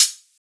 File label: Hi-Hats C